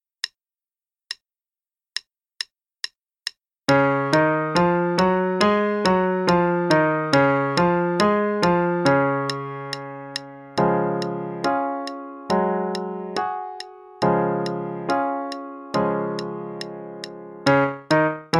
Warm-up in Db (Major then minor, qn=140); minors are staccaco; Faber; Level 2A, 2nd Ed p.63 - Db Major, then db minor

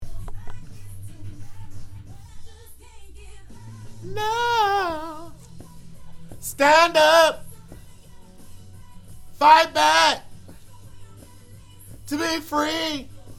Soprano

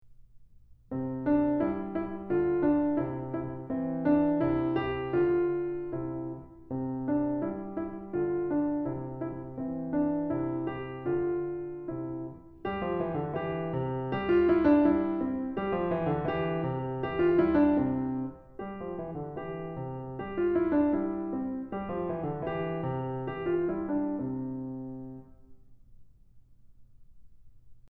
Old German Dance; Keith Snell Level 00 Baroque Classical

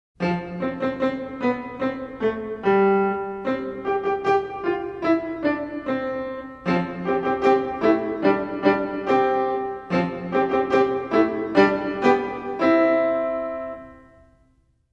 Trumpets; Marlais Book 1

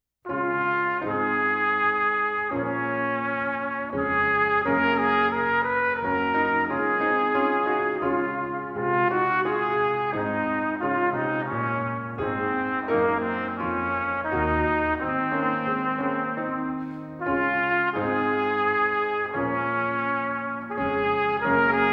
Fairest Isle performance, trumpet; canadian brass easy solos

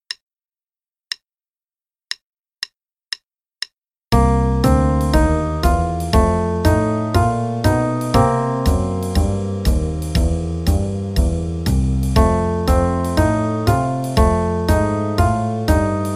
Blues, qn=120

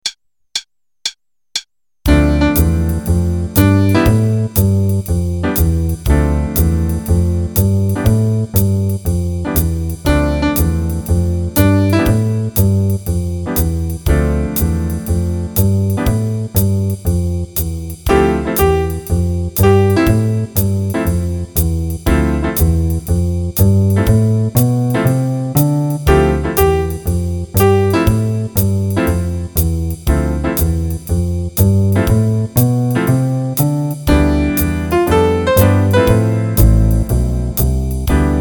Improv Dmin 32 with Jazz Rhythms; D min7 (D Dorian) (32 bars, qn=120); Trading with Jazz Rhythms, Set 1

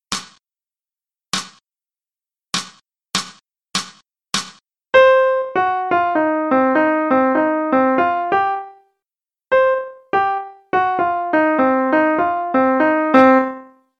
Rhythms, qn=100

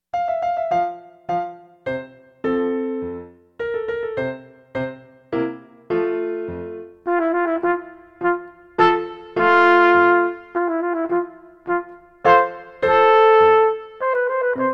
Minuet And Trio With Acc., trumpet; SOE Festival Solos Book 2

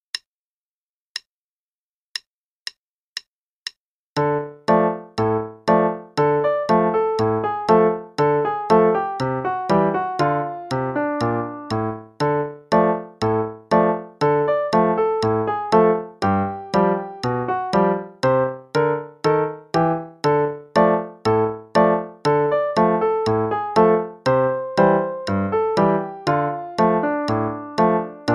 Play-along, qn=120

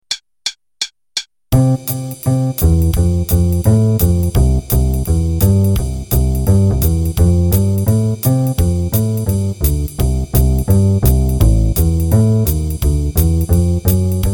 Blues in C qn=170; 48 bars; Play-a-long; Jazz Improv Play-a-Long

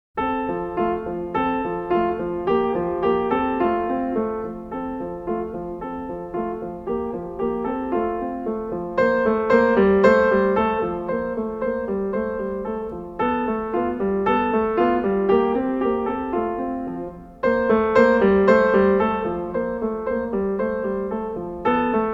Piano Piece; Magrath Book 04

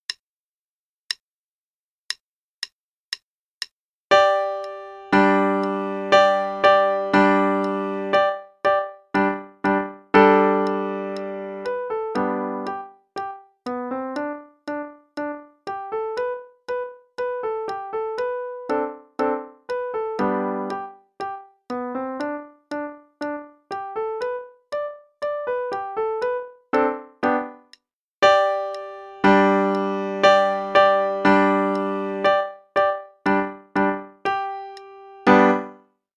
American Fiddle Tune (qn=120); Adult Level 1 - page 93

